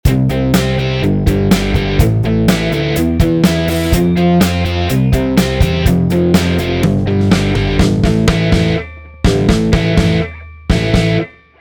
powerchord

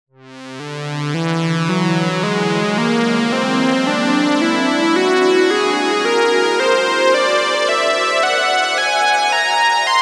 wholetone synth

